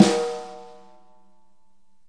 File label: Multisampled Real Kit; REAL LIVE KITS; Multisampled Real Kit BJ-HD9